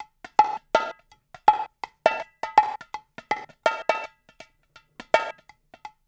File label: loop, bongos; InVision Interactive; Percussion Slam